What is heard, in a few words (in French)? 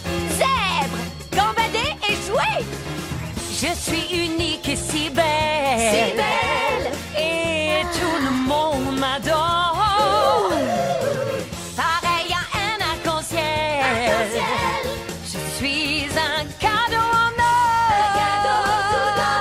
chant enfants, dessin anime; la garde du lion